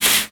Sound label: Foley